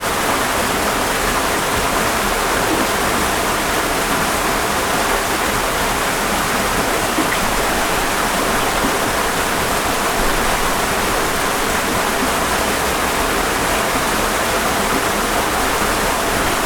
river flowing fast around and through large rocks pyrenees france